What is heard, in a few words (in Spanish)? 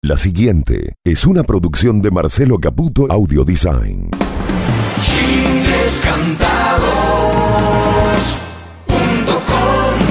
musica instrumental